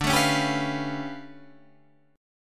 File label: D Minor Major 13th